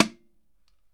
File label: CAN HIT; 1000fx